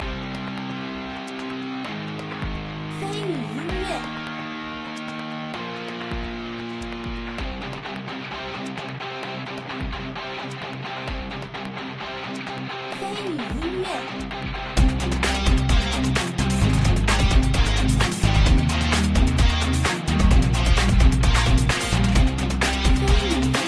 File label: Game bgm